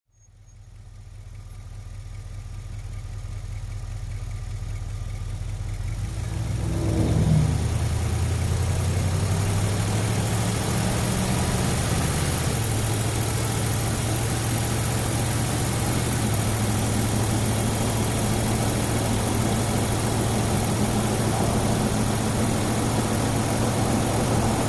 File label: Wagoneer: On Board Medium Driving, Jeep Wagoneer